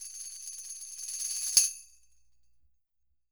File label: Roland MV8800 percussion